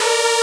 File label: synth